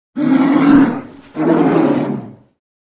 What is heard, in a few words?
Bear Polar Snarls Mul